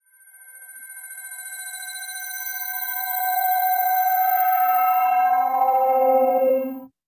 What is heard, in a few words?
Slow Ring